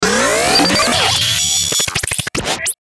Stinger Power Up; Stinger Power Up Click Chopper; Stingers

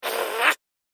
Mini Monster Annoyed; Monster Sound Effects; horror

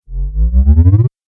Laser Beam Power Up; Laser Sound Sound Effects

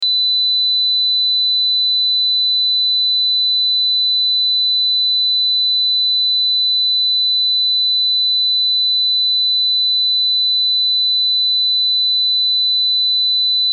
Test Tone Sine; Sine Wave 4Khz 60 Sec -12Db Loop; Blastwave

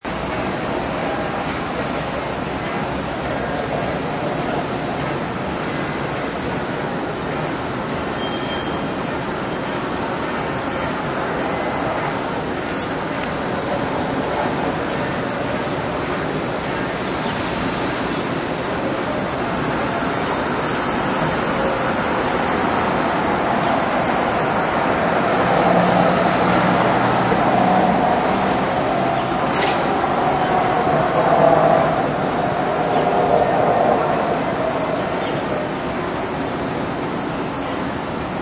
Outsd Arpt Terminal, Airport terminal ambience.; Outside Airport Terminal; Airports (Sound Effects:Backgrounds)

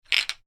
toy bricks wooden movement; Miscellaneous Toy; Toys

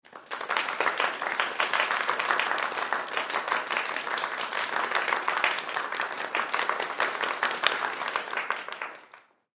Human Crowd Studio; Crowd Reactions Clap Golf Long; Blastwave

